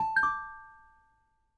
Long Rising Tones

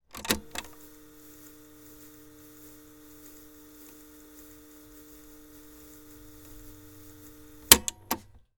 Cassette Tape Deck Rewind Slow Start Stop Clicks

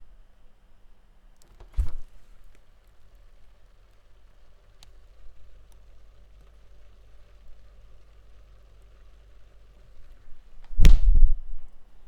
Opening Closing Fridge And Icebox

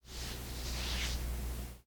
Caricia piel Skin Caress

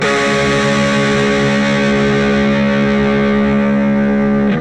C Power

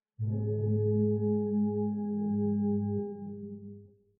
Wail

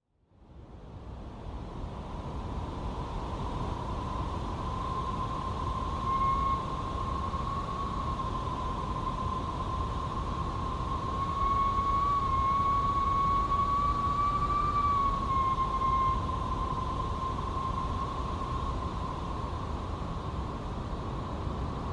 Wind

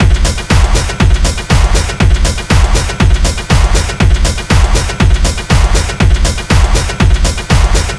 breaktrip 120

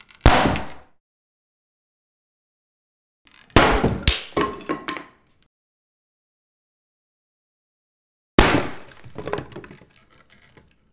Wood Impact Axe Chop Real Int Echo

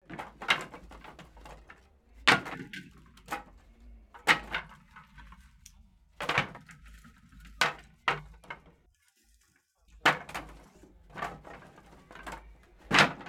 Working With Wood

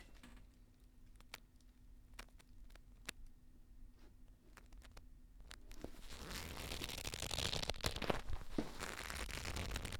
Vine Stretch

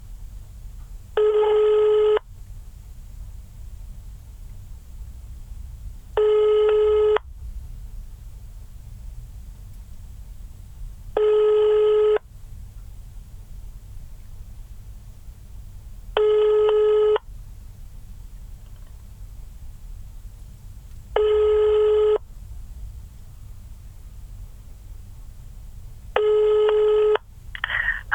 Ringtone

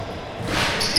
Dunk

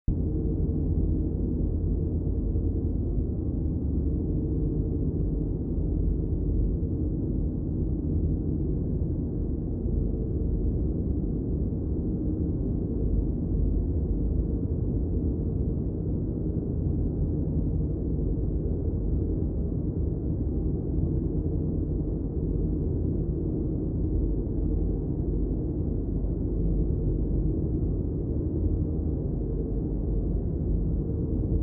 Tunnel Ambience 150hz Wide Like Road Tunnel

